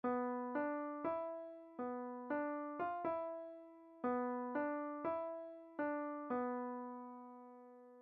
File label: play piano by ear